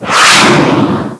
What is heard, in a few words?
missile fire; weapon